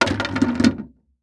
Household Bucket Plastic Open End Set Down On Boardwalk